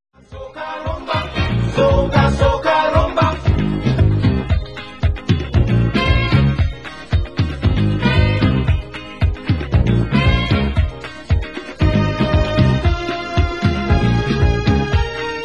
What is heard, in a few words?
soca rhumba